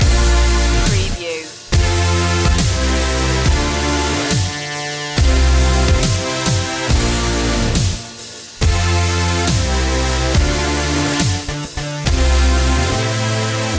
trip step dub step loop

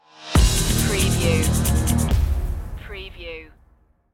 check point stinger

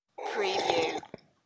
Sip

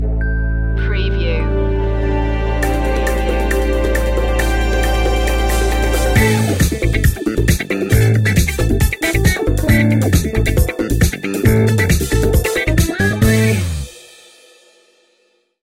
afro disco funk

